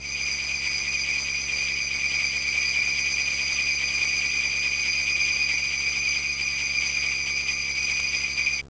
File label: sleighbells